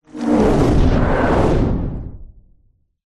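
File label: fantasy magic dark powerful aggressive whoosh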